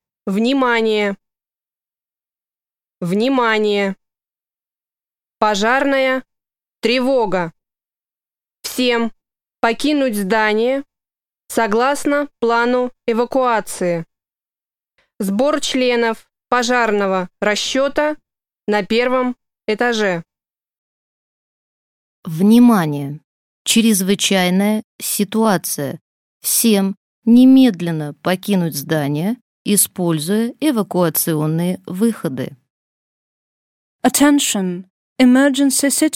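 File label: trombone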